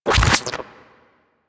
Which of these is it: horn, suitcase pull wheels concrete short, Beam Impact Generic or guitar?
Beam Impact Generic